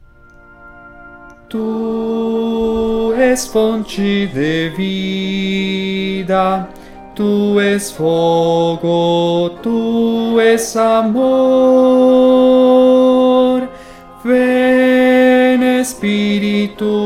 Tu és fonte de vida tenor

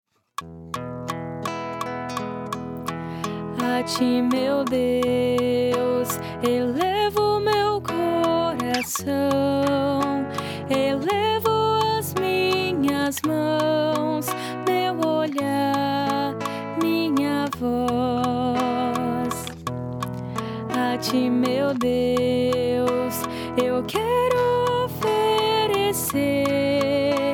A Ti meu Deus Contralto

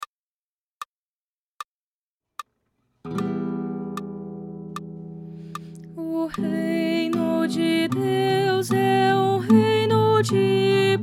O Reino de Deus Soprano

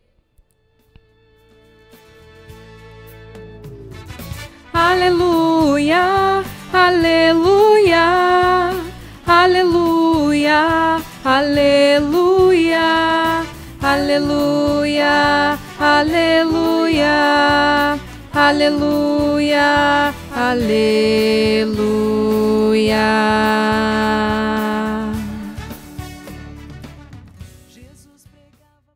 Aleluia contralto; jmj2013

